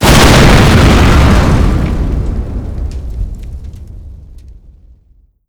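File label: explosion car distant; Explosions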